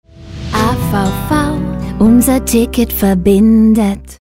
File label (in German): Soundlogo